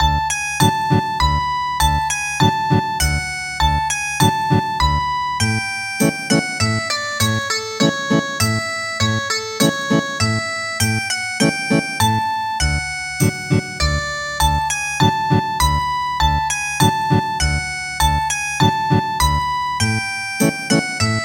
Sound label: Ethnic Guitar